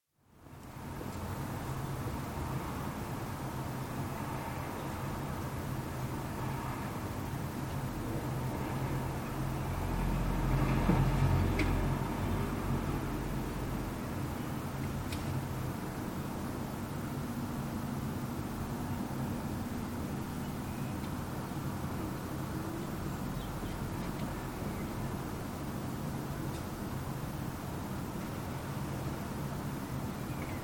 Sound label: slow traffic on the street